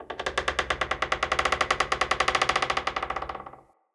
Dendrit Structure Wood Creak Small, Ambience, Fort